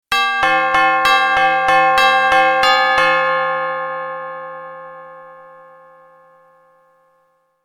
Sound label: Halloween doorbell